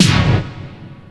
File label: BANGS; Megabass Remix! 2